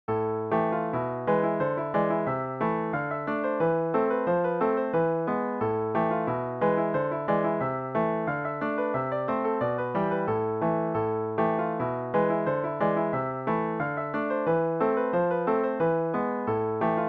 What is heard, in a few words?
scottish piano solo